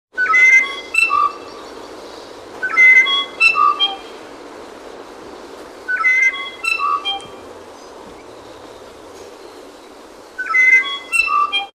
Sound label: tui invercargill